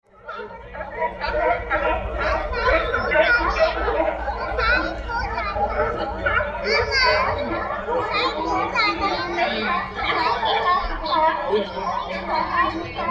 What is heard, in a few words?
pier